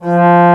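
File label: Trombone mf F2; Digital Sound Factory Virtuoso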